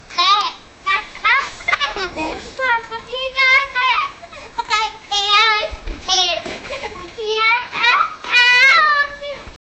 kids noise

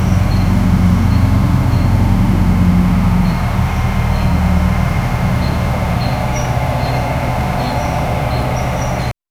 soft tractor engine rumbl; chirping

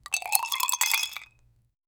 pouring champagne in to a

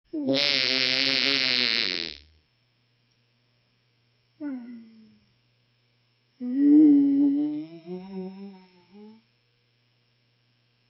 sunny starscout farts lik; cartoons

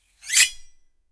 pulling a bowstring of a; bow and arrow

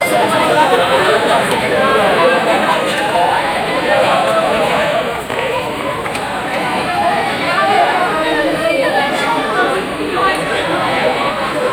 celebrating christmas, dining

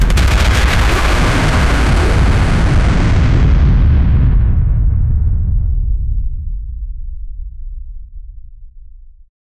rocket launch

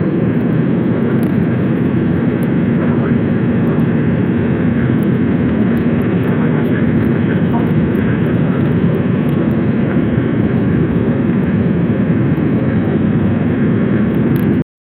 a man is flying in

